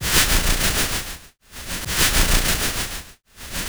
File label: Rythmic Noise 130BPM; layering